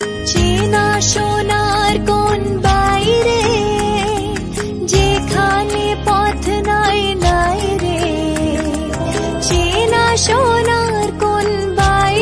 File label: Bengali Ringtone